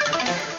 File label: loose, sfx; BALLY; CORVETTE; Son Corvette Original